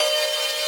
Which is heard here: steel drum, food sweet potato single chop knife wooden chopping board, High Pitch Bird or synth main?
synth main